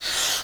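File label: breath, female vocals, left; PlatinumPhattCD2; E-MU Producer Series Vol. 8 – Platinum Phatt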